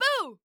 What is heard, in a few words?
Human Female Boo Short